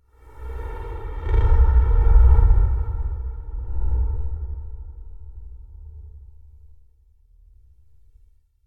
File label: Whoosh Deep Feedback Air Whooshes; Hollywood Edge; sound designer's toolkit v2